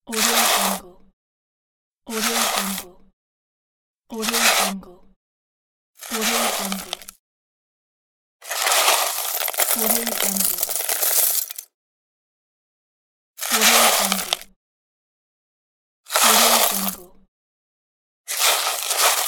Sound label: shovel